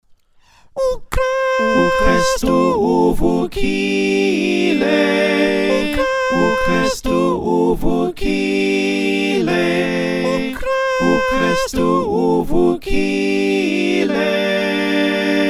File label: SATB